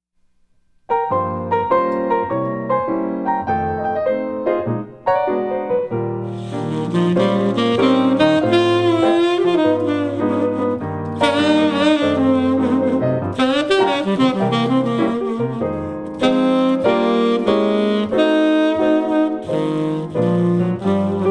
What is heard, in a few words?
Duo Piano; Jazz